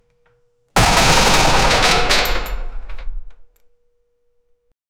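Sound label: a heavy metal exercise machine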